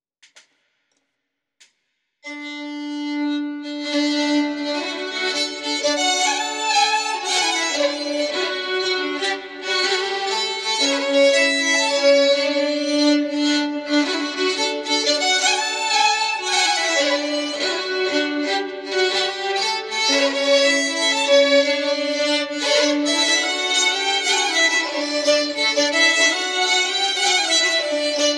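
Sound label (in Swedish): Polska, svensk säckpipa; efter Erik Pettersson Fiolpelle Djuprämmen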